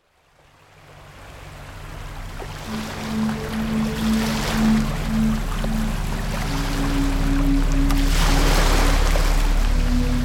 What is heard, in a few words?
Ocean